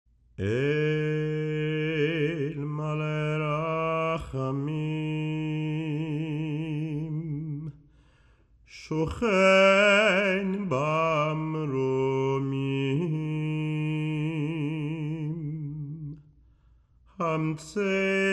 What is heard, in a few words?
Eil Malei Rachamim